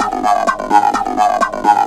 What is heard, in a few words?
VFX2 Loop 128 BPM